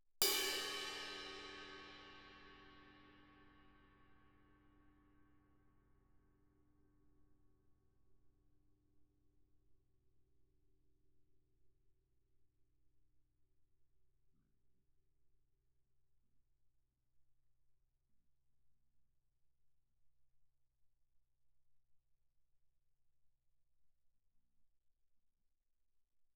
crash stick normal ff, suspended cymbals; Percussion

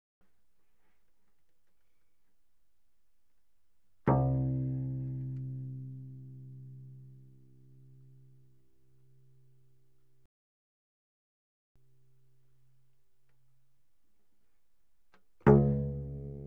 Cello pizz ff sul C C2A2; Strings

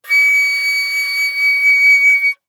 Flute vib ff Db7 stereo; Woodwinds

